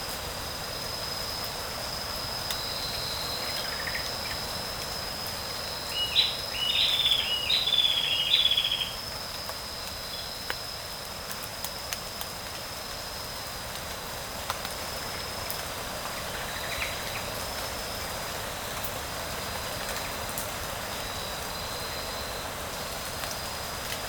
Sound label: Forest Morning Calm Birds